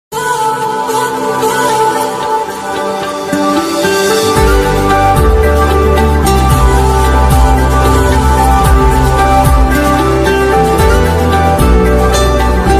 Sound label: bgm ringtone remix song ringtone; Surya the soldier movie